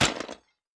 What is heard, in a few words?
Break Plastic